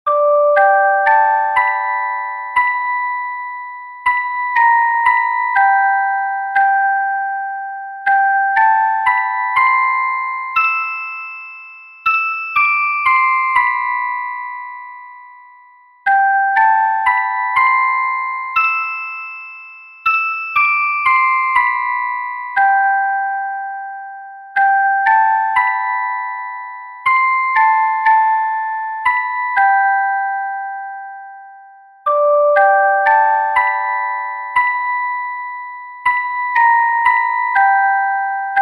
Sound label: children handbells; various composers collection